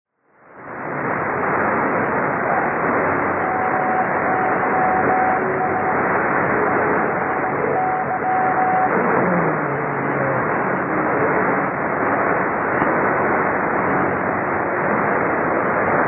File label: 6220kHz Mystery Radio